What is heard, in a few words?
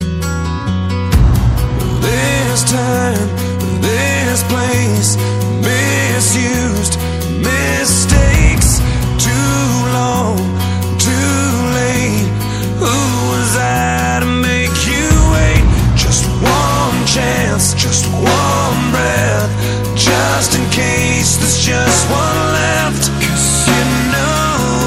new far away song